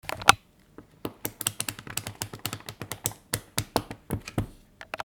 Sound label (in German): Katalog Seiten umblaettern